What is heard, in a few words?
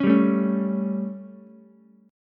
G Suspended 2nd Flat 5th G Ukulele; Gsus2b5 strummed; JGuitar